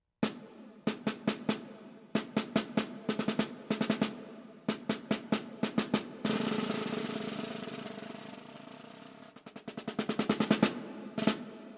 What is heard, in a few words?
snare drum